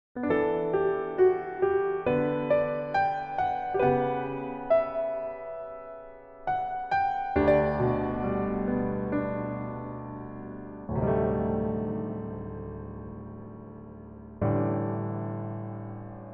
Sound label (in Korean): Piano ver 남자키